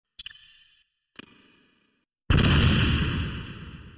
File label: grenade; mgs